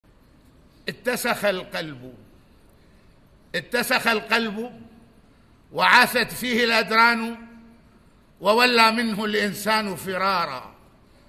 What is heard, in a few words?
loop